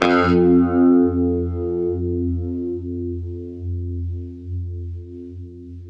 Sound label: UNIVIB F 1, Guitar; East West; Steve Stevens Guitar Samples Collection